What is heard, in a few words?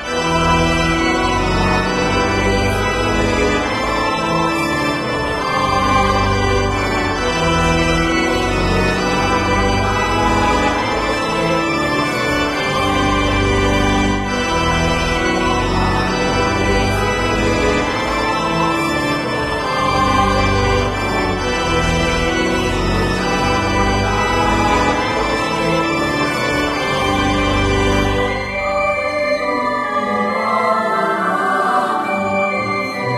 Pray Organ loop; Drago Noka; ps4 switch windows gamerip 2022